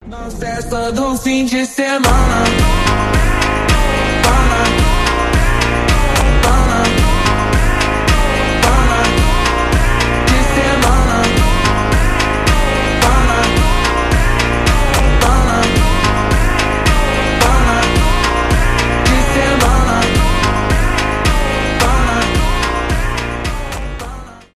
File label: super slowed